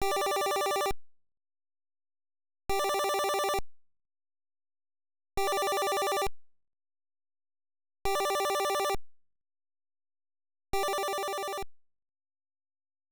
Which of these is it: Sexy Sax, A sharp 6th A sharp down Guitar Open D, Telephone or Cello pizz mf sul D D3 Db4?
Telephone